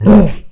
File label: BARK; pups